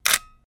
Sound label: Nikon D2x 1/125s F1.8